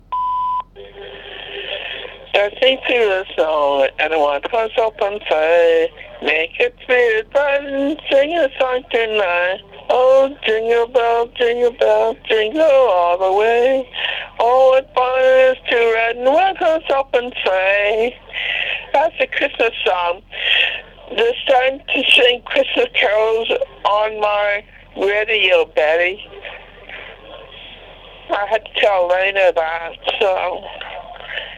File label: jingle bells